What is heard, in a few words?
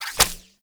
Pump Shotgun Reload Shell A; Weapons; Warframe; Zariman